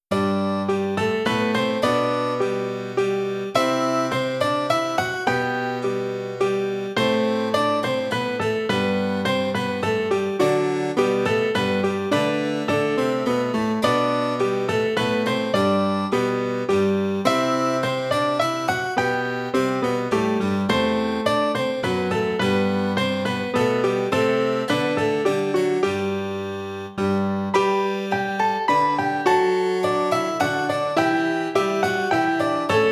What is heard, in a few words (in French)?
Menuet en sol majeur instrumentale